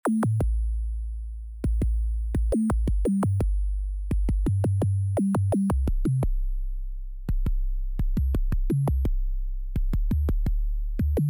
subkick no effect

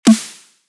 snare eq

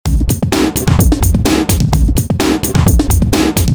original low

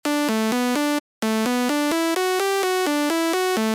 attack pitch

